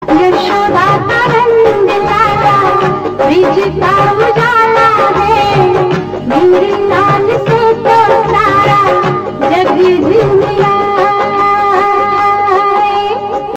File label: Ringtone